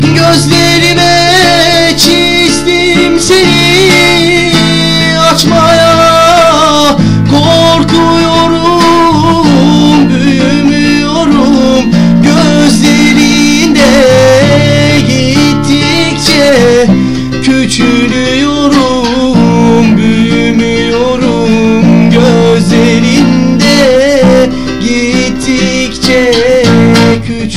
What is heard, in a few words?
Turkish Song